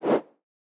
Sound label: air woosh; soundscrate